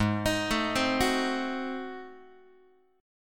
G sharp 7th Flat 9th, Guitar; arpeggiated; chord; x,4,6,7,4,5

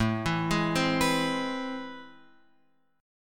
A Minor Major 9th, down, Guitar, Open F; arpeggiated; JGuitar

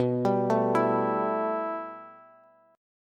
B 7th Suspended 4th Sharp 5th B down Bass; B7sus4#5 arpeggiated; chord shape; JGuitar

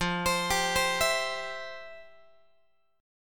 F Major 7th Suspended 2nd, F, down, Guitar

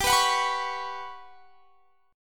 G Major Sharp 11th G down, chord; Listen to GM#11 strummed

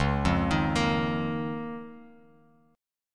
C sharp 6th Add 9th, Bass Standard; arpeggiated; C#6add9; JGuitar

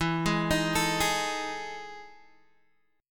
E Major 11th down Guitar DADGAD

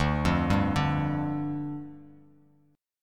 D-Minor Double Flat 5th, D, Bass Standard; Dmbb5; arpeggiated; JGuitar

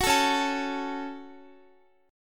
C sharp Suspended 4th Sharp 5th, Guitar; C#sus4#5; strummed; x,x,16,14,13,16; 4E,4A,5D,5G,5C,6F

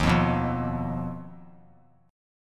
D sharp Suspended 2nd Sharp 5th D sharp; strummed; JGuitar

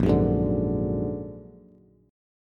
Bb Augmented 7th Bb down Bass; Bb+7; strummed; JGuitar

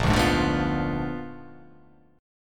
A sharp 7th Flat 9th A sharp down

